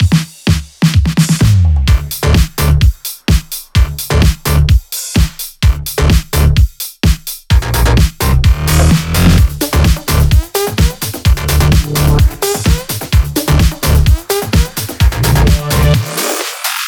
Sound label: Latin E Mix, 128BPM; Latin E Kit